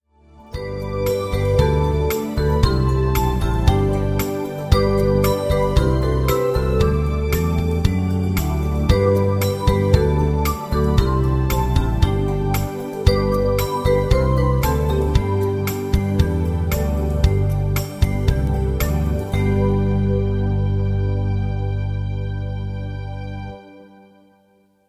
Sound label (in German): Floetenmelodie Playback ohne Floete